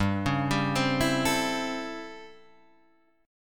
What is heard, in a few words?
A 7th Sharp 9th